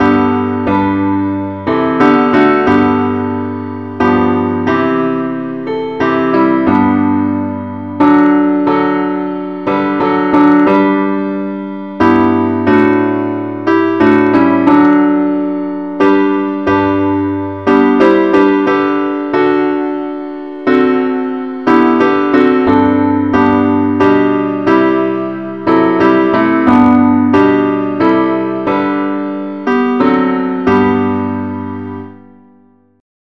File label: parts only, key G